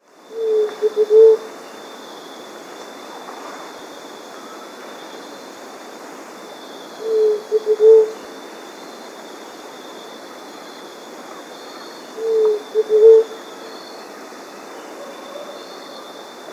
Large-Tailed Dove (Leptotila Megalura), Andean Birds; S. America